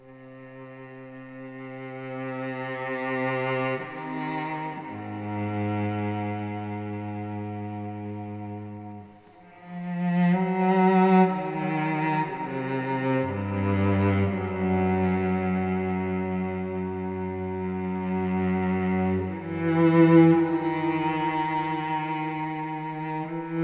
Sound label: Theme Reprise Cello